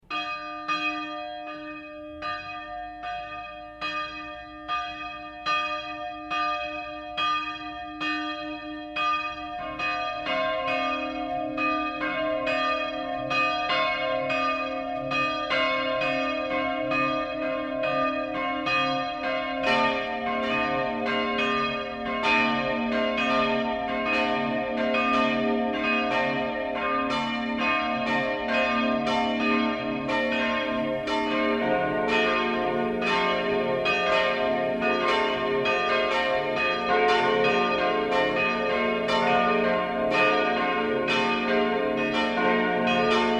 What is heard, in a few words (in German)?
Simulation Tonart Ges